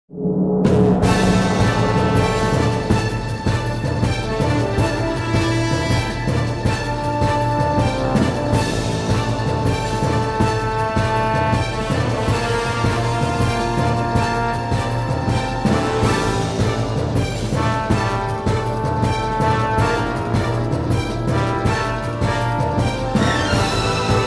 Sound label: Battle Music; tokobot plus mysteries of the karakuri; playstation 2